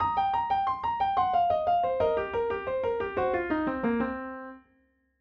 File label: piano blues run long; blues runs